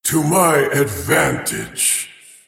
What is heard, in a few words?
last hit pain; Void Spirit